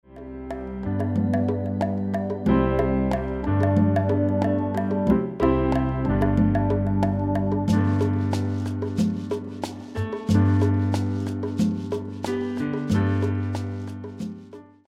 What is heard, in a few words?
accompaniment